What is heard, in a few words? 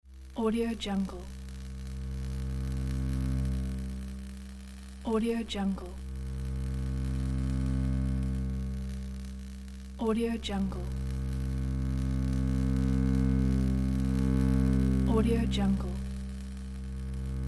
electricity